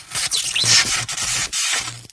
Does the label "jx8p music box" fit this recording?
No